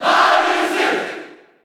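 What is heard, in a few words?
Cheer Japanese; Marth; SSB4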